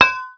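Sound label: grenade launcher bounce, weapons; quake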